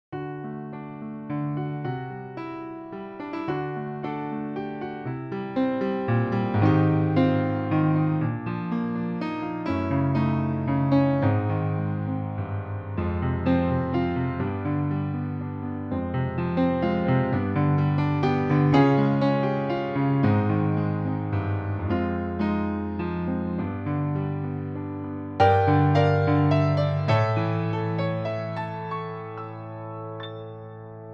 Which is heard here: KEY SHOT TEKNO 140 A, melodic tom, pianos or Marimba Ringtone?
pianos